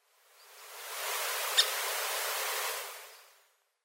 koakagera, obihiro, koe

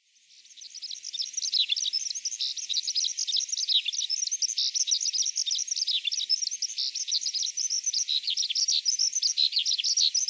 nakigoe hibari

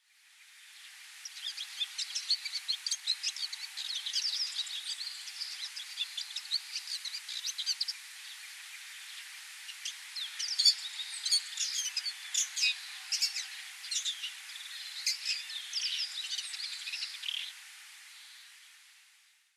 chigomouzum, fukushima, koe

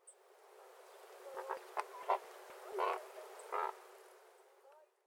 momoperikan, inba

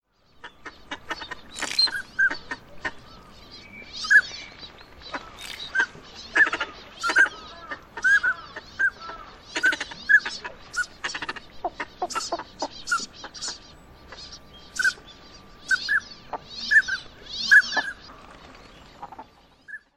onagagamo; オナガガモ; 求愛; 埼玉県さいたま

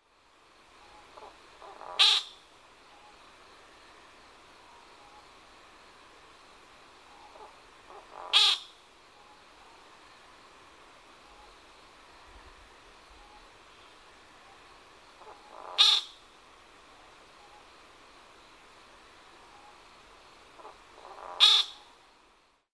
amamiyamashigi, kagoshima, koe

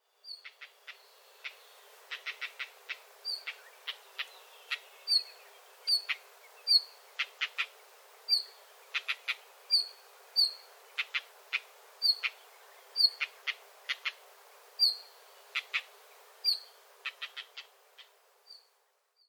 ruribi; memuro

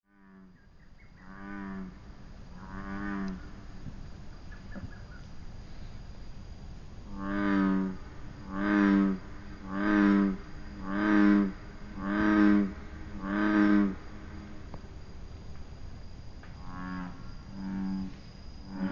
ushigaeru; izu

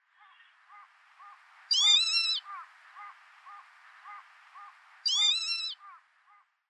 tageri, hyogo

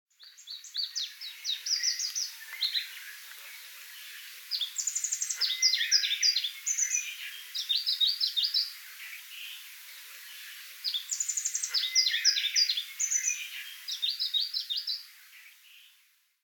karafutomushikui, nagasaki, koe

